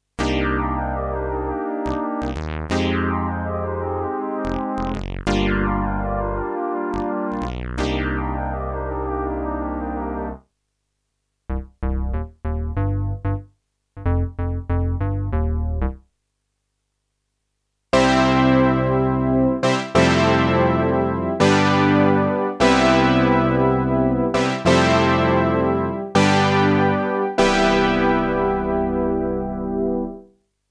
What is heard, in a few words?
Alesis Prestige Artist Synthesizer